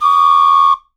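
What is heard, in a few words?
two tone whistle; Voice Crystal - Steve Reid Definitive Percussion; ASRT WHISTLE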